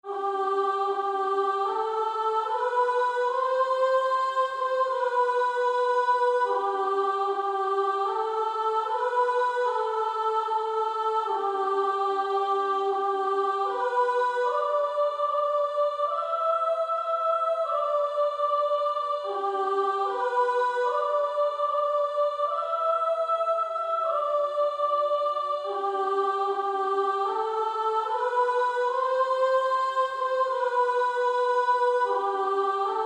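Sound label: Female Choir, soprano 2; Gracious Spirit Dwell With Me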